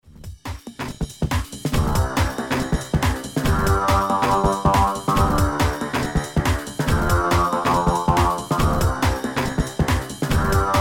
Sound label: tonic audio gate side-chain